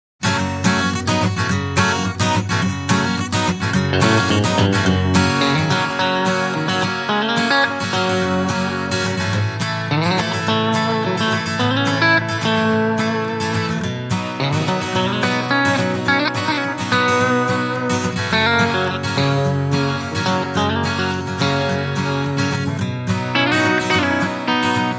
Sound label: No Bass No Drums